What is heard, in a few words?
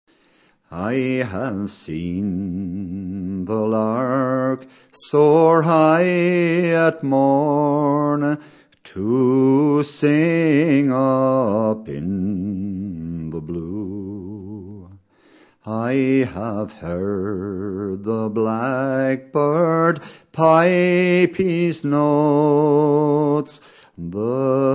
singing bird english